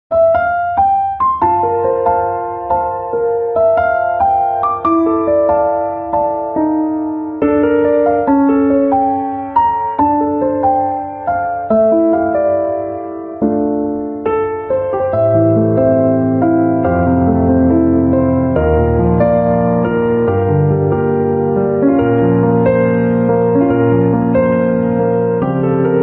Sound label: Piano Instrumental Ringtone